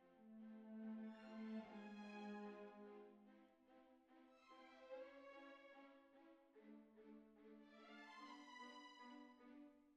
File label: Cbasses